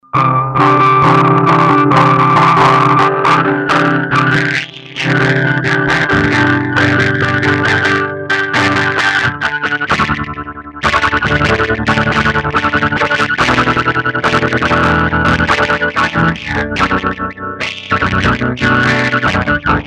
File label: Guitar; Plague Bearer